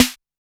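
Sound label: Electro Kit Snare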